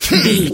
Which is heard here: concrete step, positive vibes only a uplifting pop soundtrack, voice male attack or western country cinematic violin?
voice male attack